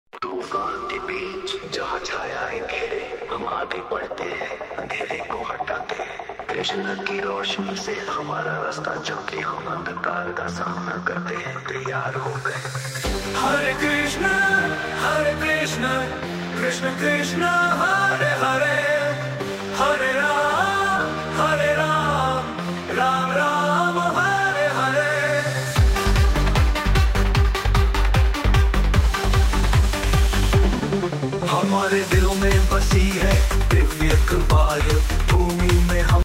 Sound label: hare krishna goa trance version music; epic; hindi